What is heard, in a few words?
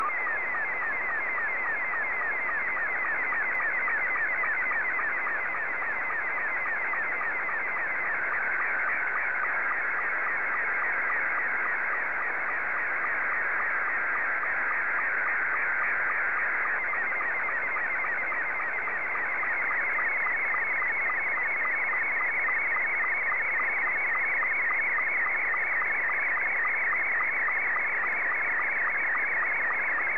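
Scottie 2, slow-scan television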